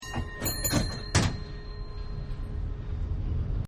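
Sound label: San Francisco Cable Car Bell; Streetcars; Vehicles; Partners In Rhyme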